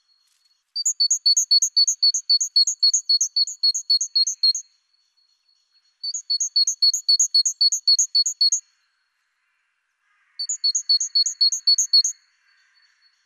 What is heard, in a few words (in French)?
Mésange charbonière